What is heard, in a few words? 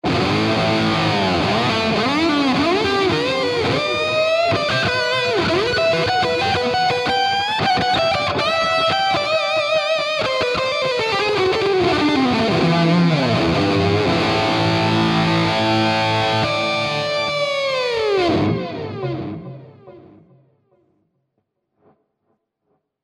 Martial 80s Lead; Audified ampLion 2